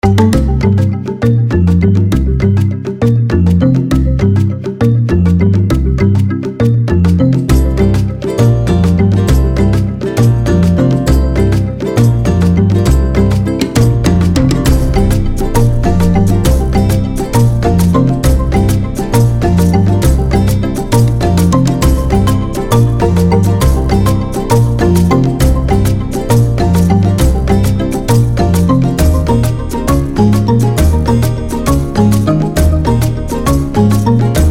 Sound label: tiki tiki luau reel spin tune